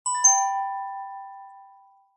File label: multimedia alert bell chime notification metallic high pitched